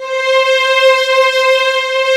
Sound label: JD990